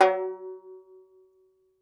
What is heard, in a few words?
Tenor Banjo, Plucked Strings